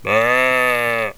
sheep; megaglest

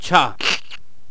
horseman attack; megaglest

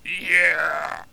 spearman die; megaglest

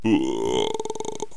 die; megaglest

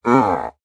giant, animals

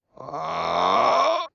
swordman die; megaglest

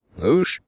swordman ack; megaglest

